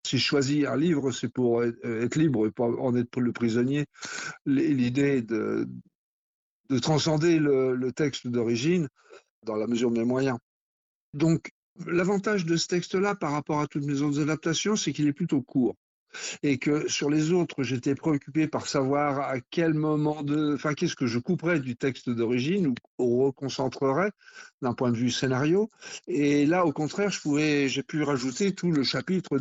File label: Riff adaptation; kernok le pirate